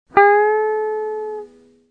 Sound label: bending, egitarre